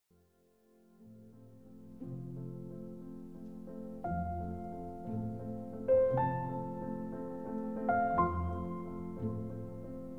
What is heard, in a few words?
piano concerto; mozart